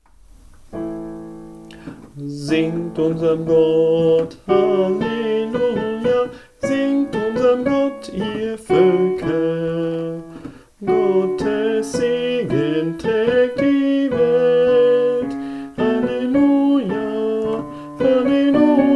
Singt unserm Gott Melodie

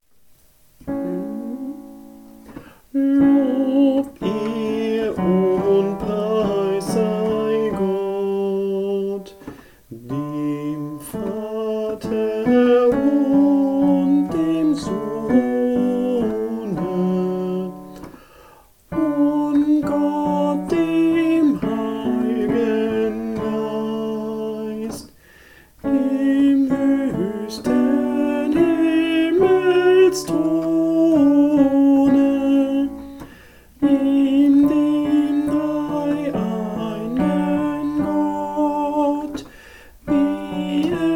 Tenor, Kirchgemeinde; Lob, Ehr und Preis

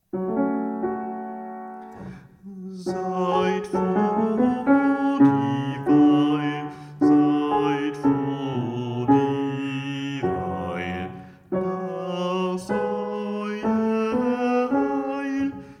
Seid froh Bass

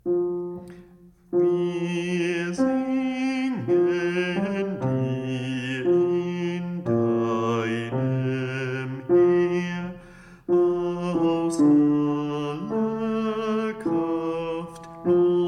Wir singen Bass

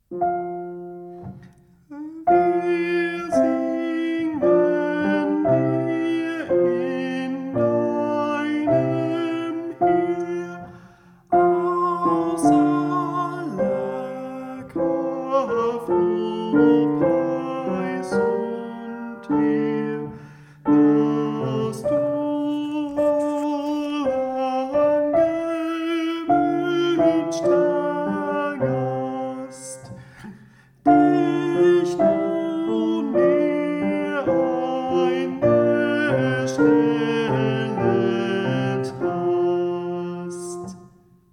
Sopran, bach choraele sopran

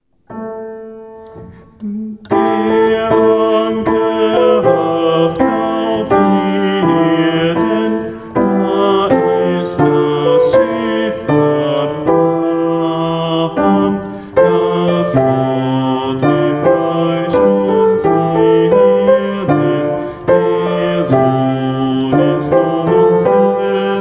Es kommt ein Schiff, adventsmusik bass

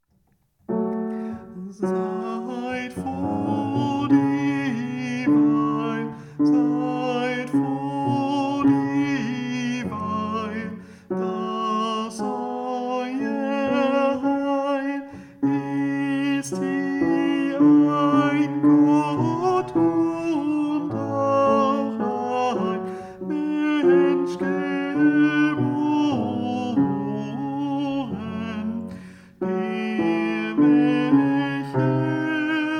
Seid froh Tenor

